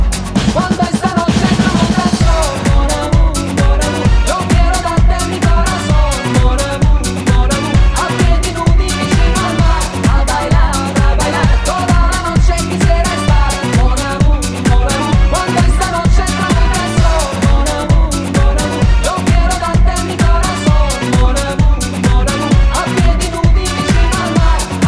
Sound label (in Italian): remix canzoni napoletane